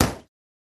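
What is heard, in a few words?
Boxing Bag Hit Short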